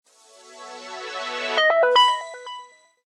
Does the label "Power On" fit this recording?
Yes